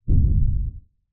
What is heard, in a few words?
Monster Footsteps; HauntedBloodlines; SFX Producer